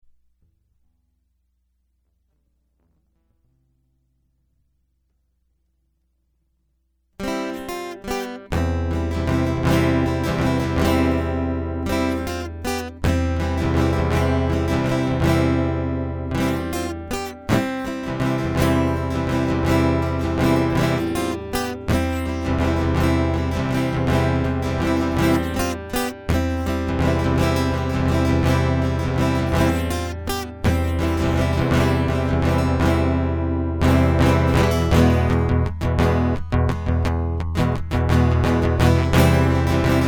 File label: Guitar Loop